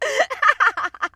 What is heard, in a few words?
happiness